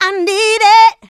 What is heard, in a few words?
Female Vocals; Houseworx